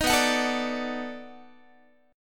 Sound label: B 7th Sharp 9th, down, Guitar; B7#9; strummed; 18,19,21,19,18,x